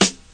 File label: Sparkle Snare One Shot F# Key, steel snare drum tuned to the F# note